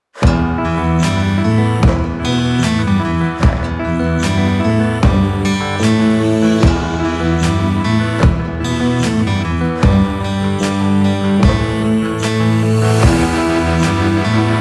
dark western

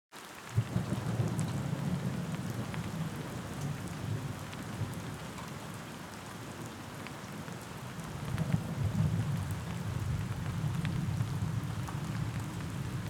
thunderstorm

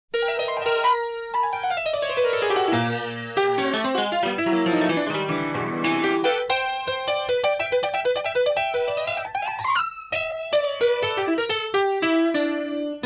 backwards piano

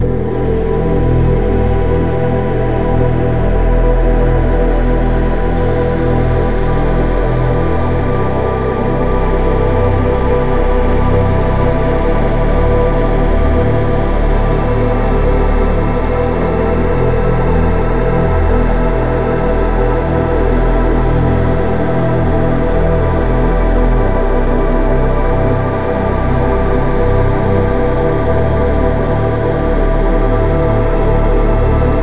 music of nature

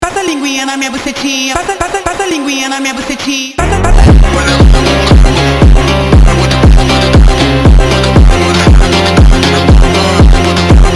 malevolent funk

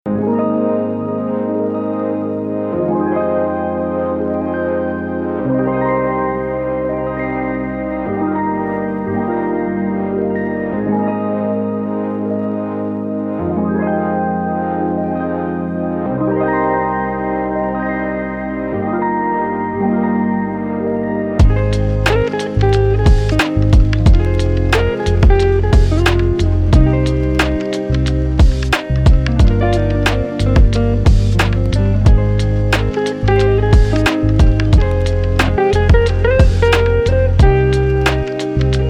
chill kitten rain song

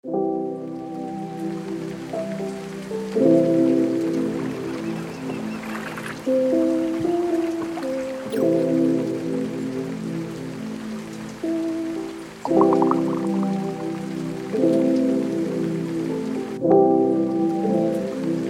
ocean waves

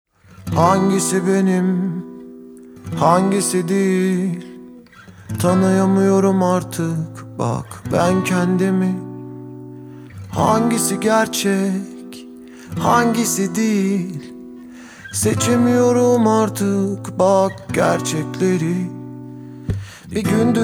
acoustic; turkish

